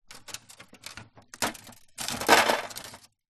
household freezer ice cube mould turn release cubes plastic tray